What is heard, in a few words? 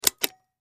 Push Button Plastic